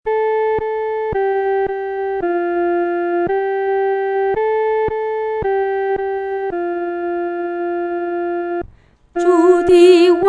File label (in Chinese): 独唱（第一声）; 完全平安